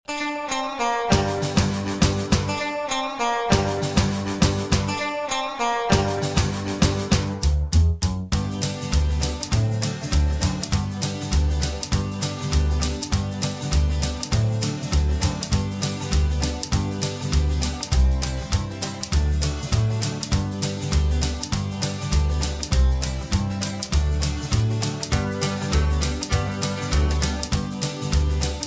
(G) No Backing Vocals

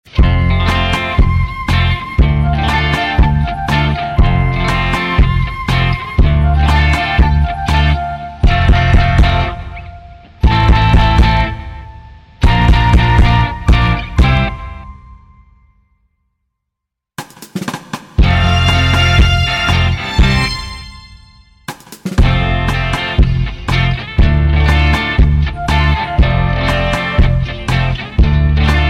(F) No Backing Vocals

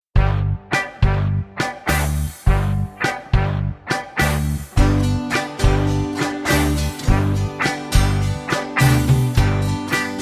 (G To Ab) No Backing Vocals

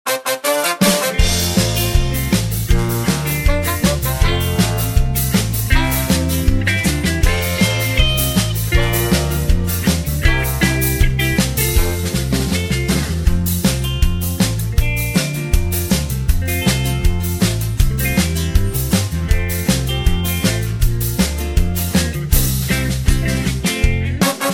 (F To G) No Backing Vocals

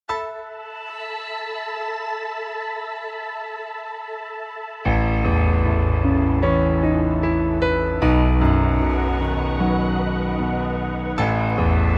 E No Backing Vocals

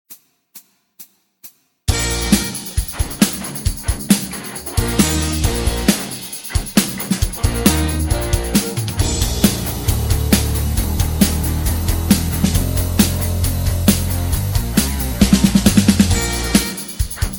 (Bb To Db) No Backing Vocals

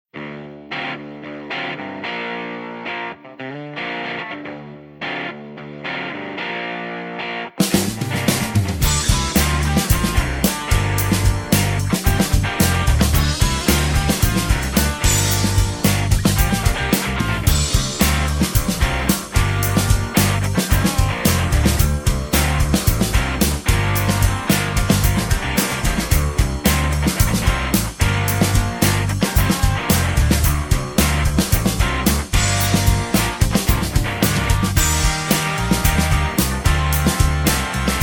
(Dm) No Backing Vocals

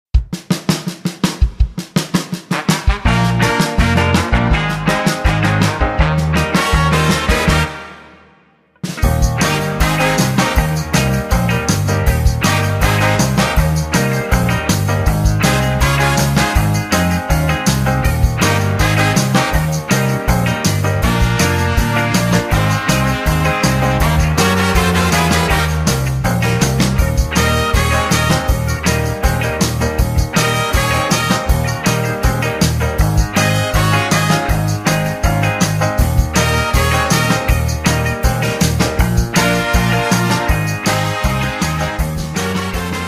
Eb No Backing Vocals

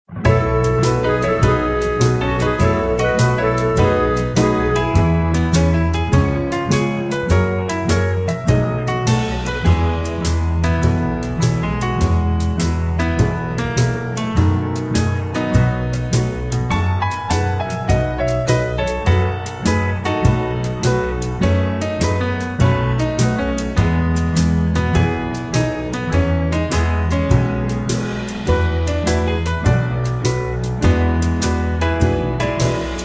No Backing Vocals

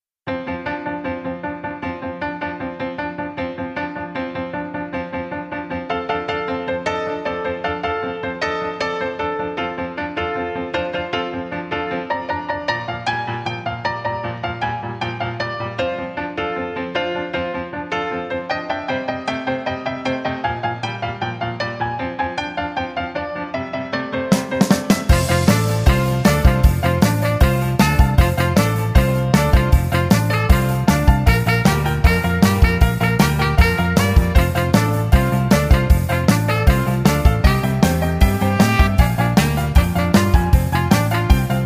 (F) No Guitar

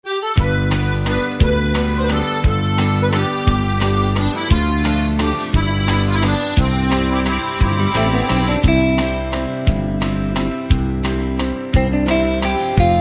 C No Backing Vocals

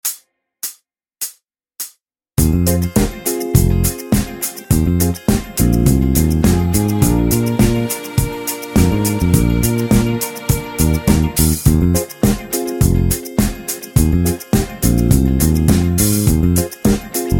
No Lead Guitar

